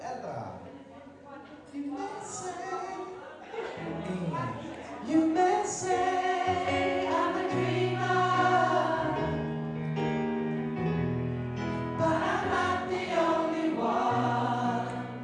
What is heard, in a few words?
imagine alt